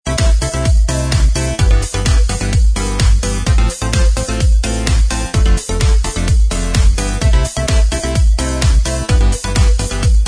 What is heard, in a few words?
Inst Track